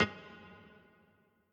piano riff intro

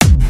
kick

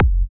Kick; WAProd Free Kick Samples 2018; WA Kick Pack 6

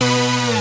synth

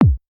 kick

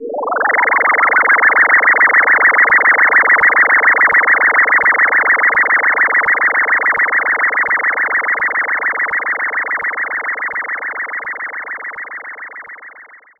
SE MAP MOVE FLY; maimai

